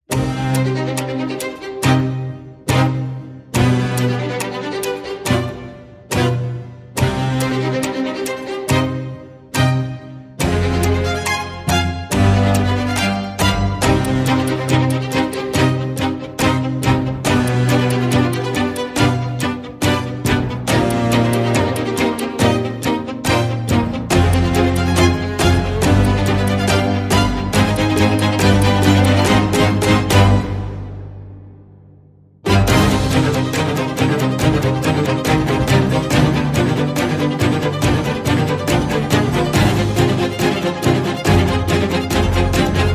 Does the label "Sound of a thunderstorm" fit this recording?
No